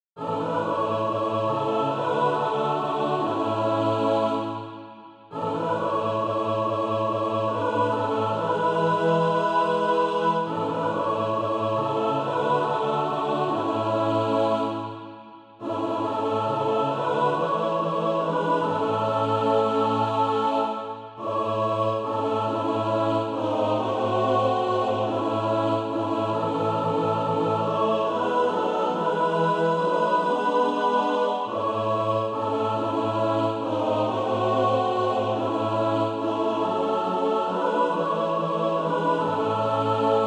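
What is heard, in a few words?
mixed, Practise Music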